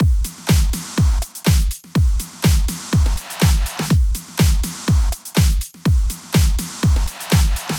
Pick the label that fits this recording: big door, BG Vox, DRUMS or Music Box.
DRUMS